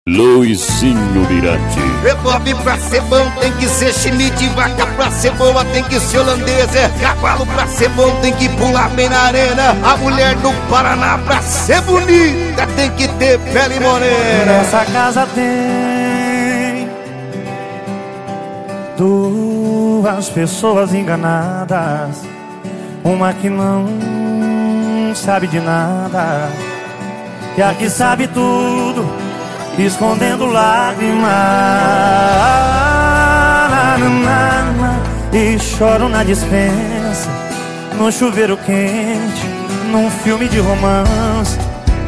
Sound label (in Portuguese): sertanejo com locutores de rodeio